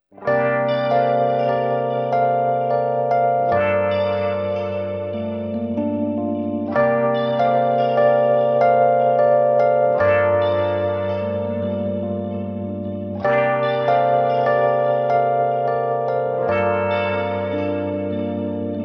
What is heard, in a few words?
rain, instrumental version-